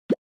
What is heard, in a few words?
meeting mic turn off; openim